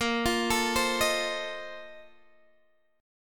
A sharp Major 7th Suspended 2nd Suspended 4th A sharp Guitar Open G